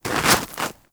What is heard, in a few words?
Armadillo Move Snow